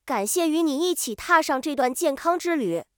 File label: ending